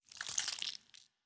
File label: Sponge Gore